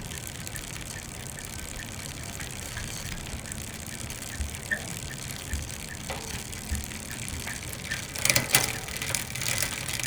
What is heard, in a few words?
Gear shiftin'